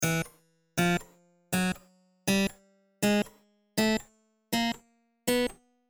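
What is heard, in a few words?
stacc Dry, harpsichord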